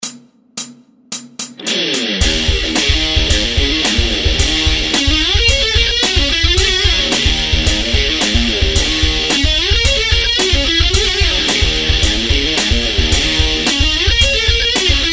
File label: just riff